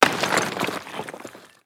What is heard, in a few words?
ore fall